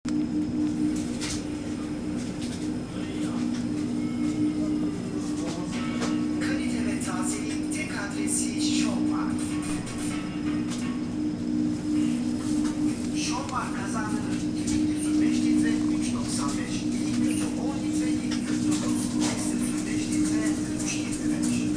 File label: supermarket soundscape